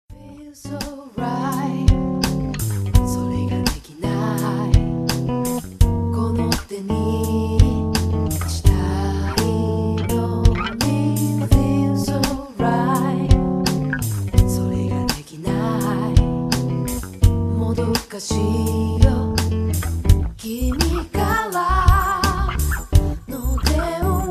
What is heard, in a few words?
jazzy